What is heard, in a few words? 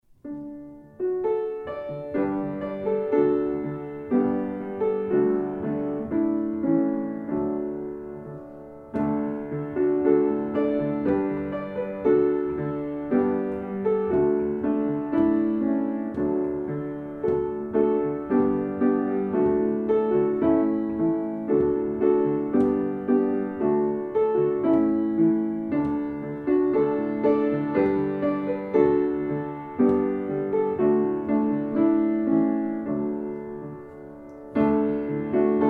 instrumental